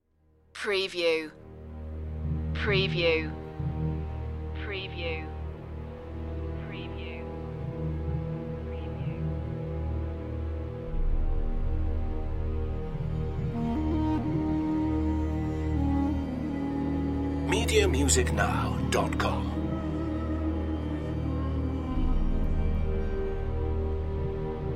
big hollywood dramatic score

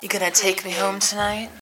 Sexy Vocal

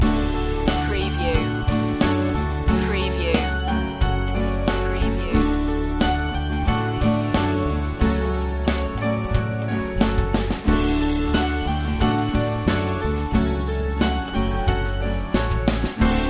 street stories loop longest loop

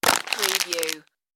crush plastic bottle

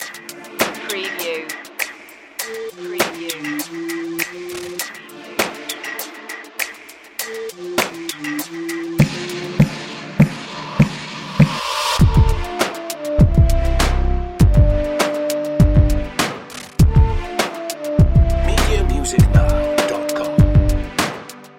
urban mood loop

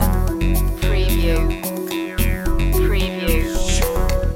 river adventure loop

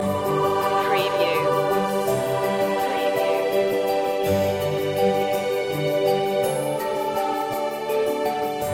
christmas morning loop

